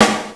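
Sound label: COIN DROP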